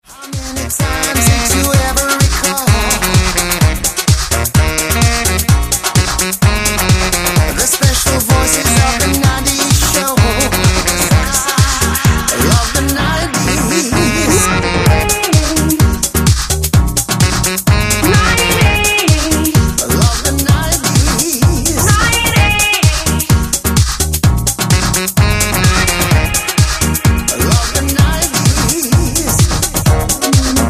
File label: Saxophone, Radio